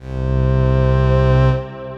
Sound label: Horn swell, Opus; Ableton; Factory Packs